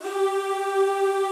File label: G3 R, Classical Choir; Best Service; Extended Classical Choir